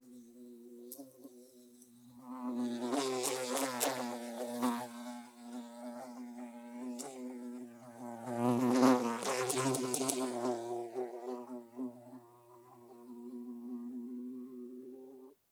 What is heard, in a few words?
Insect, Fly - buzzing around, pass by, landing; Audionym; The Fly